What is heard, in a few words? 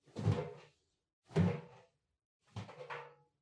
Washer Front Load Far Clothes Put In